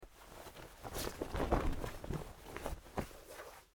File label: rope sail cloth flap